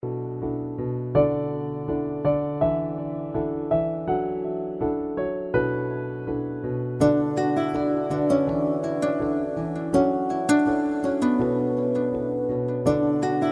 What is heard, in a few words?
Sweet Guitar Drums